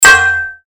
Sword Sound; FXHome; Fighting Sounds

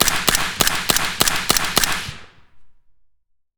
HK 416 5.56mm on gun Seven shots; Heckler & Koch; DPA4062

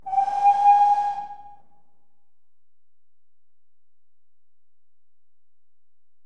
Engine Whistle (GWR); BBC Sound Effects Library; Age Of Steam

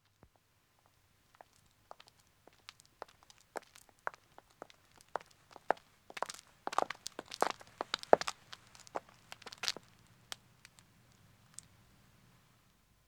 Country Road Walking 2 women; Footsteps; BBC Sound Effects Library

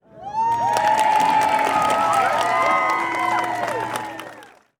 audience cheers; Audience Reactions; Soundrangers

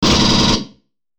M41A Pulse Rifle; FXHome; Bullet and Gun

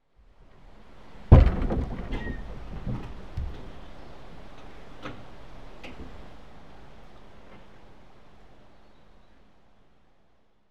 Rivers And Streams, Lock Gates; Rivers Streams Water; BBC Sound Effects Library

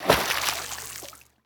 fish small jump, Bubbles and Splashes; Hydrology

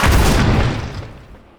EXPLOSION Bang; GameBurp; 2000 Game SFX

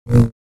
Saber; FXHome

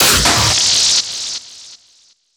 Impact - Abstract; Glitchedtones

